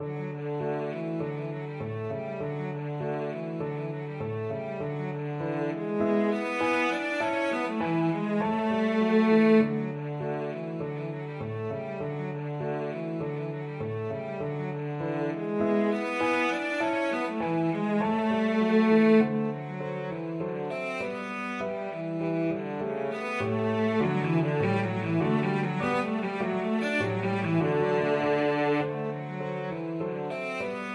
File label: cello